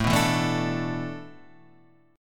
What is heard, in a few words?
A Suspended 2nd Suspended 4th, Guitar, Half Step Down